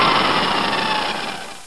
hw spin down; svencoop

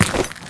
player dirt

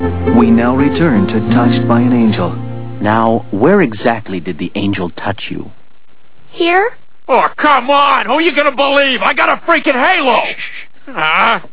angel; tfc

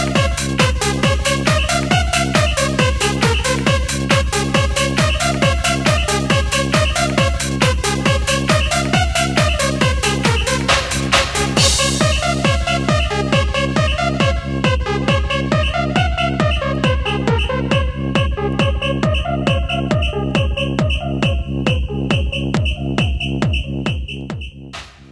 germans win; dod; winter

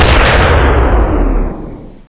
sentry die

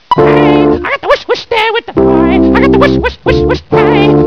ick

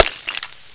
crush

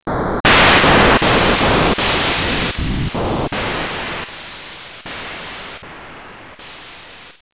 shield

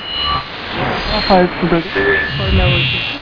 tune; cheesehmaps

